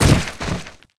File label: Fall cloth